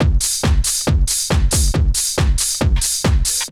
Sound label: Essential beats